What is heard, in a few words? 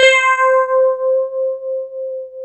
DS8 C 5, Korg